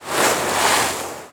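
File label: Dolphin mov Start Water; RA3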